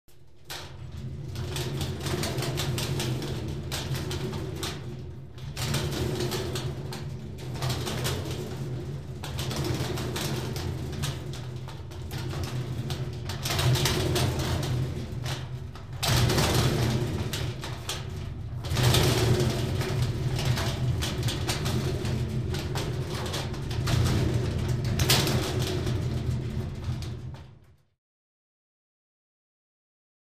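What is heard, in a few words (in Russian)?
катают металлическую бочку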